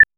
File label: soft pleasant ui mouse cl